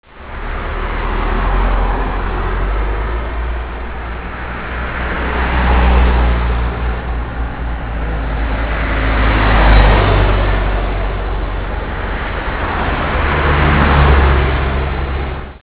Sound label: traffic, 道路交通騒音 [Roadtraffic-noise]; 現実音（騒音）; ステレオ型式 -20dB